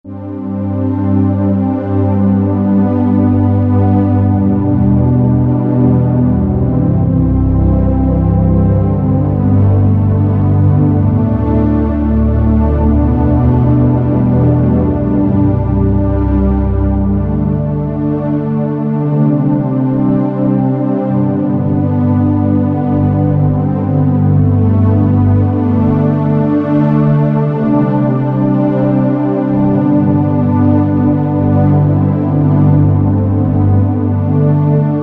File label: Underscore PAD; Worship Flow